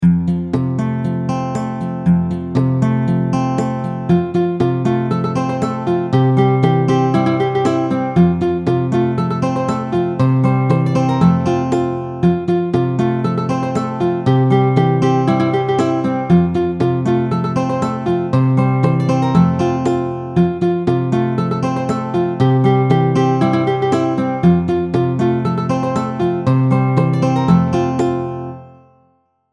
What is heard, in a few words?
ukulele; Rainbow Ukulele